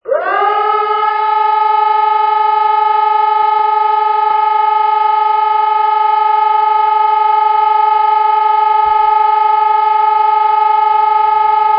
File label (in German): sirenenprobe